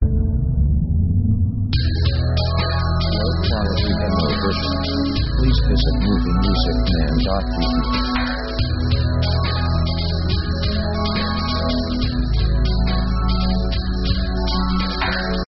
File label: Rhythm 140bpm; synth themes